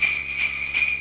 sleigh bells

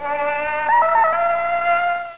tarzan

